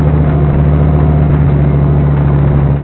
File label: char, ambience; dod